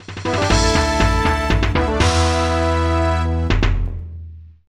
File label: Bgm Jing; Mahjong Fight Club DS